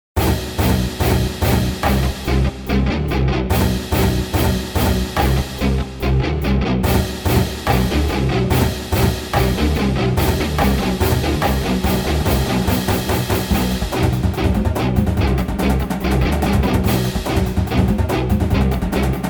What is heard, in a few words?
rumble drums